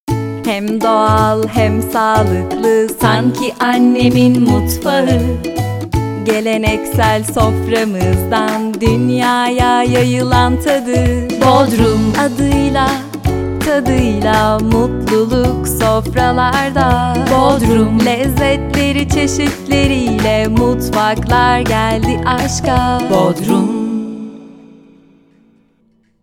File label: jingle; bodrum